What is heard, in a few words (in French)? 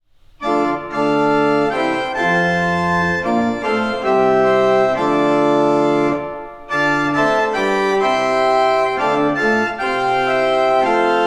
Claviers accouples Bourdon 8 Montre 4 Gemshorn 8 Rohrflote 4 Montre 2